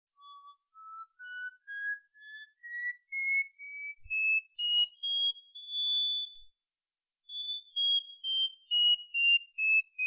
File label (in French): son galoubet